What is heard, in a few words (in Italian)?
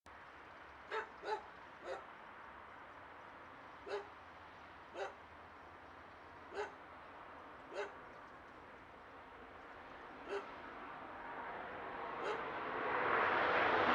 Treno mono; Eigenmike; A-weighted; Nuova Strada